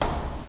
footstep metal; footsteps; gearbox

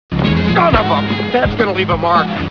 stinger

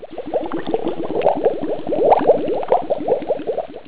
lab brew

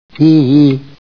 heehee

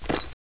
bandit walk casual step right; gearbox

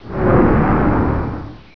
fly by

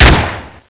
fire, colt; colt1911

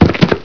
dirt, player; firearms

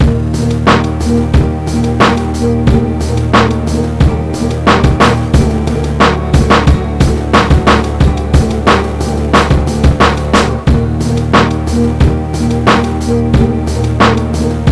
end song; svencoop

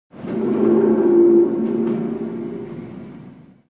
howl

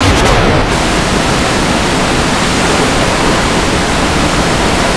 water cannon on; svencoop; keenhalloween

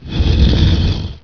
stone; tfc

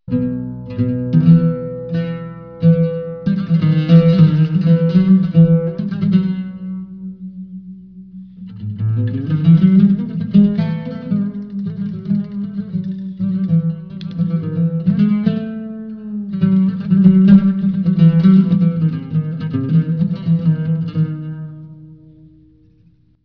dust music; cstrike

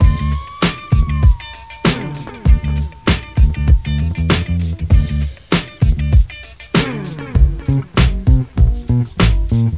club

